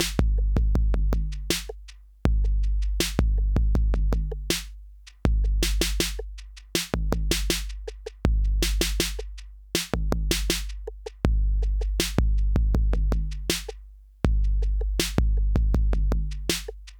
Chandler Limited Zener Bender Software 808 LIMIT SC OFF; Softube; 808B BSP4